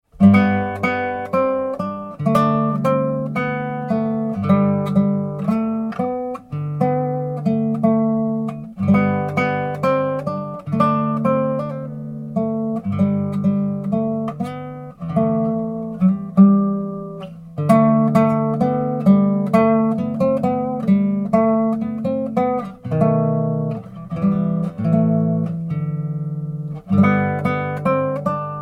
Gitarr; 16 bit

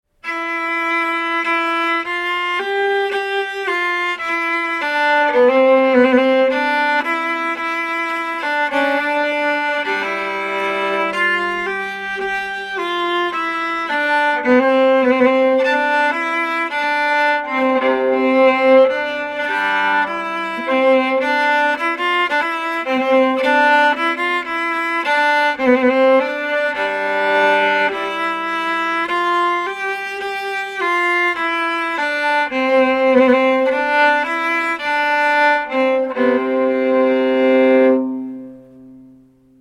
Altfiol; 16 bit

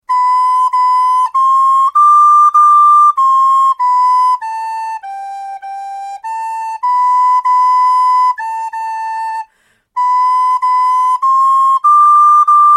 Blockflöjt; 16 bit

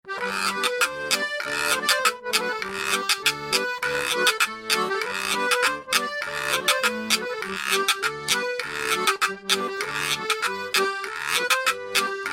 Gurka; 16 bit

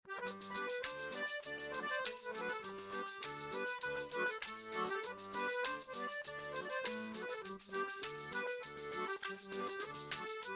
Tamburin; 16 bit

